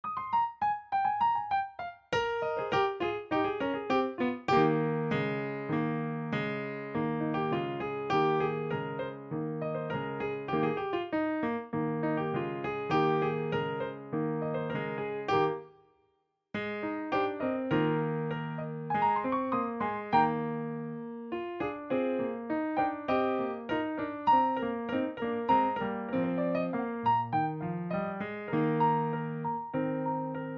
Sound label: ACCOMP; Sarasponda